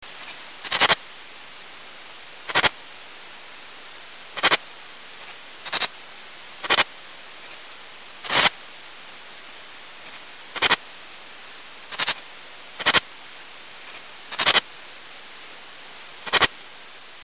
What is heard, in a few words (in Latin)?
pholidoptera griseoaptera; Heuschrecken; insekt; wirbellos